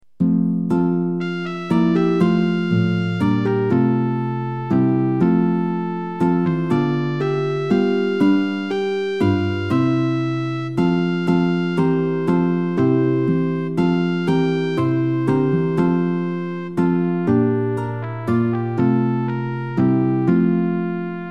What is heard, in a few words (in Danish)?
Ps23 koraldiskant